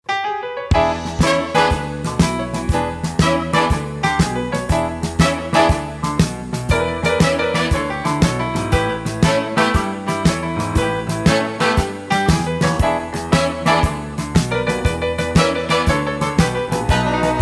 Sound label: Blues